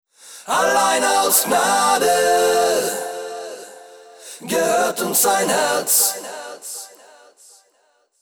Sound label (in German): Handyklingelton Chor Clean; mottolied